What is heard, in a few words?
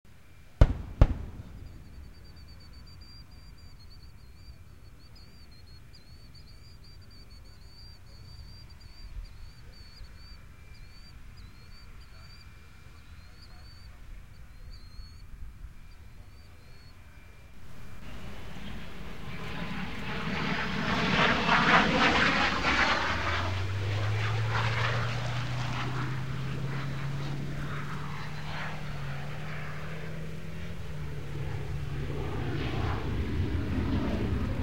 Launch Sound Shuttle Sonic Booms and Landing; Nasa